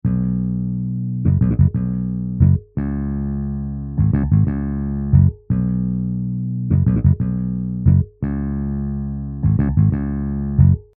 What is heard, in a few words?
bass